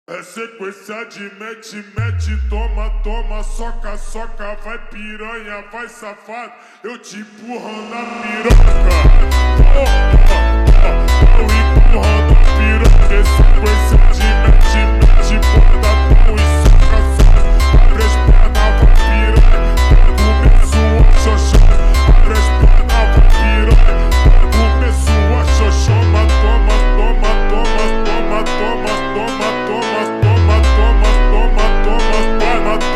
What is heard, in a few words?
slowed Reverb, funk